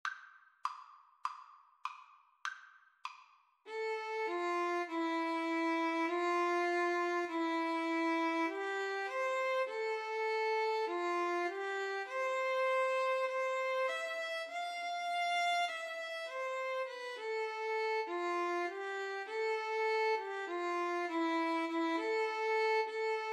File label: mountain thyme, Violin Flute Duet